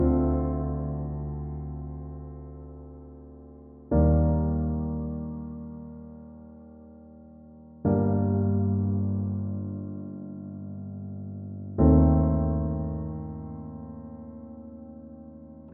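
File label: 122 BPM Piano Chord Progressions Cinematic Drama A major G minor Tonic D; MMB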